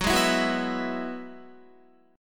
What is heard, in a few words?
Gb 7th Suspended 2nd Suspended 4th Gb down Guitar; Gb7sus2sus4; strummed